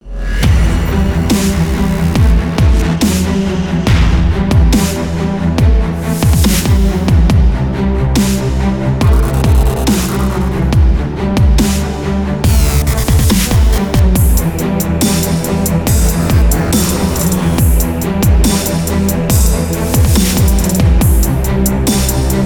epic motivational cinematic dubstep

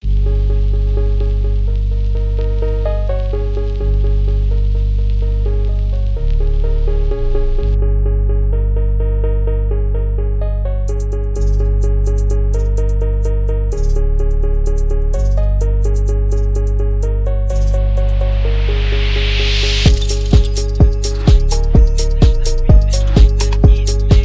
phonk beat

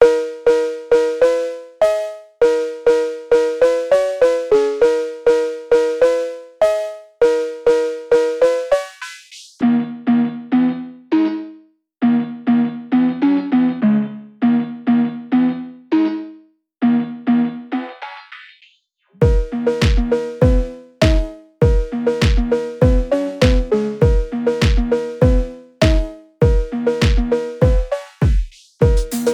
phonk

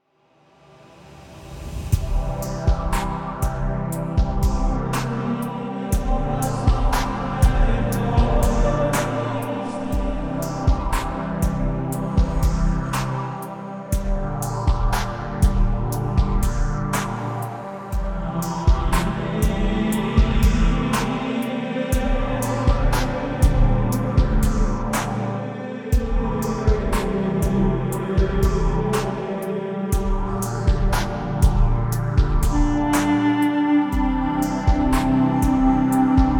ethno pop track 1990s electro new age ethno

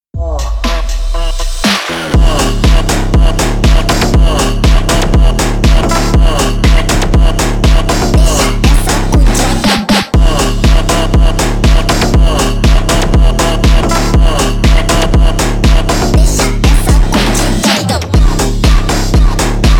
tempo phonk

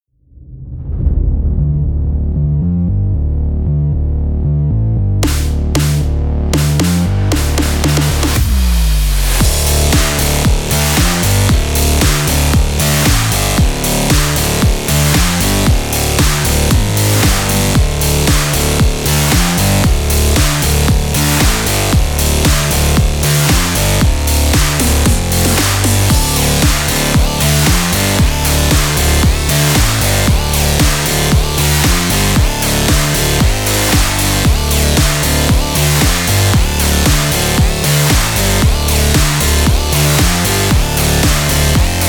aggressive electronic energetic sport

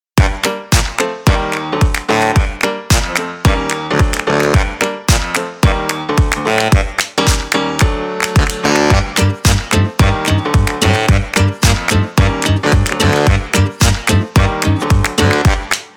electro swing funny catchy retro

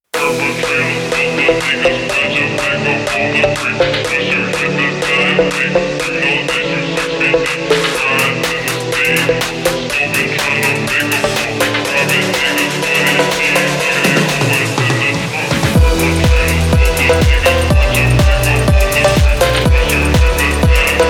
funkadelic nights phonk

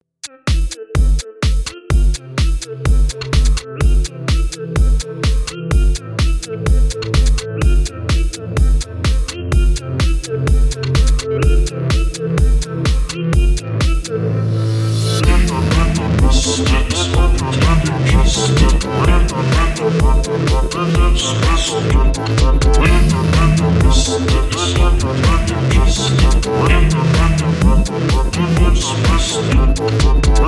drift phonk song

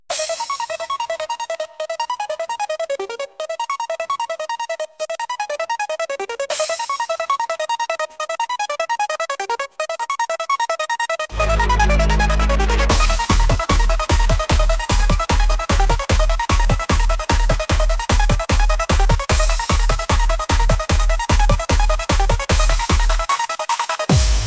ride the bass bass

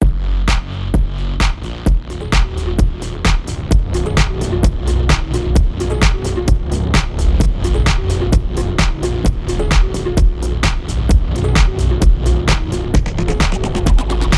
calm phonk

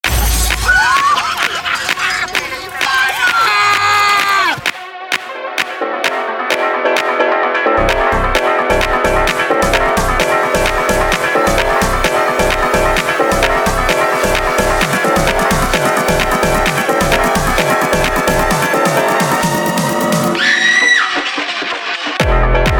phonk

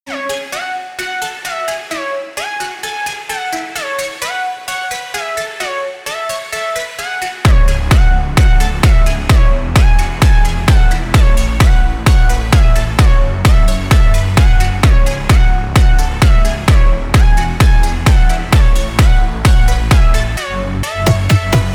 brazzilian funk

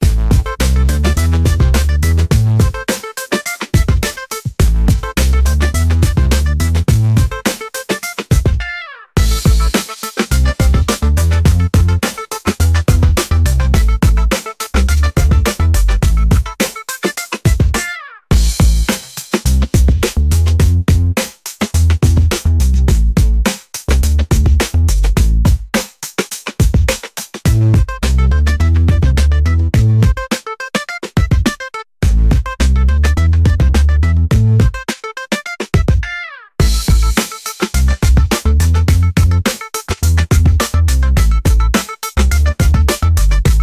breakin down the beat

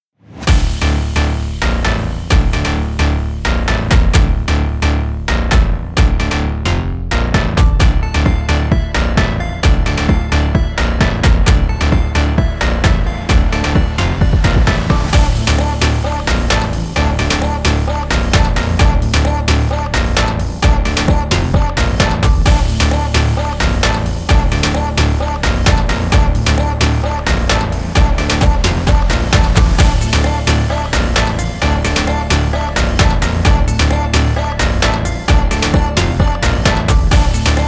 phonk music

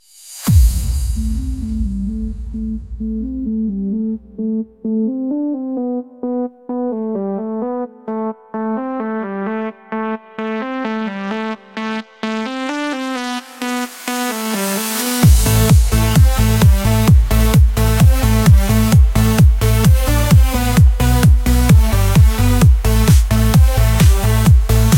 electric surge bass

